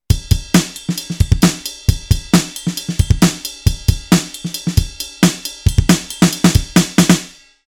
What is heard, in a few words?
Drums